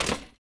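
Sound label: footstep Stone; droids robots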